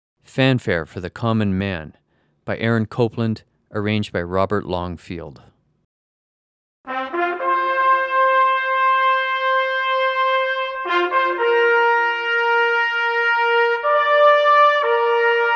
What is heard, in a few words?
Fanfare